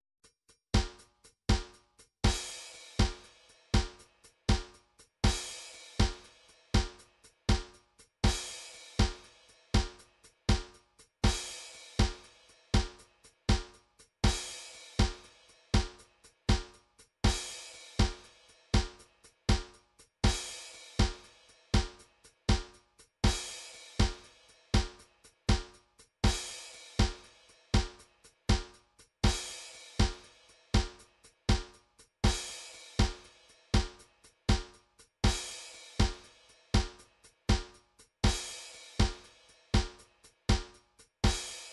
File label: drums, midi drums